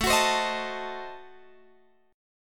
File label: A Diminished 7th, Guitar Open F; strummed